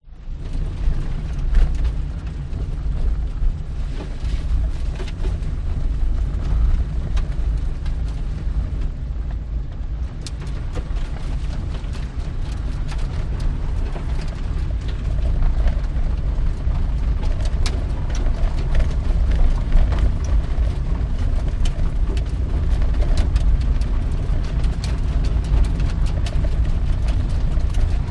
vehicles car suv drive bumpy track internal

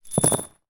several drywall screws drop on carpeted floor; industrial

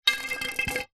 sport pool cue roll hard ground

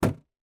balloon inflated hit against wall or object

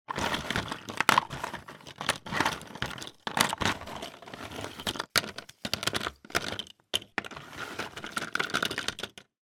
toy puzzle peices in box rummage; zapsplat

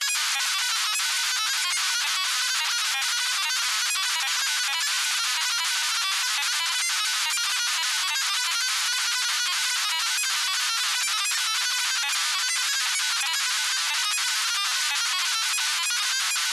science fiction data stream lo fi loop; Skyclad Sound

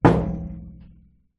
impact metal thud inside microwave oven

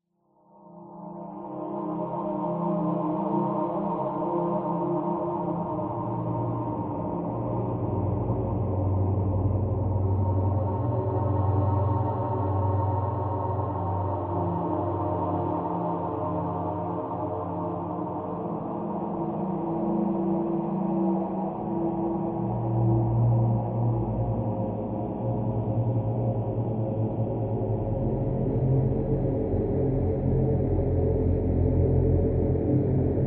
horror texture drone eerie evolving; scott lawlor

